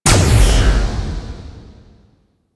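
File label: Sundering Dissipation Projectile Explosion; Warframe; Focus; Unairu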